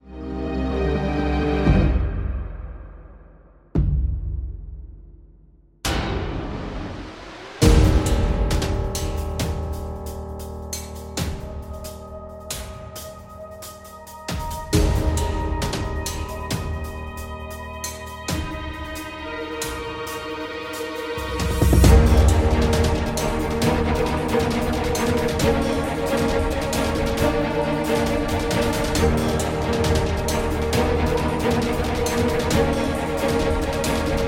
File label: ACTION DRAMA; Music Cues Sci Fi / Action